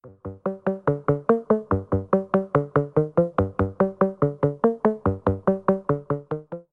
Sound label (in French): accords